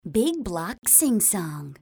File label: big block sing song; disneyjunior